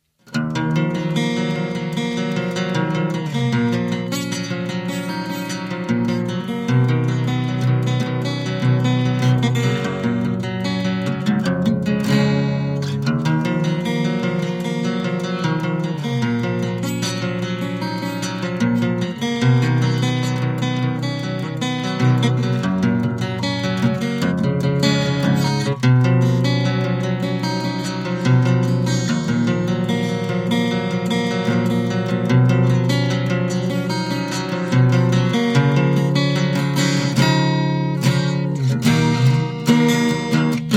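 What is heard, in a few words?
zvuk gitary, guitar sound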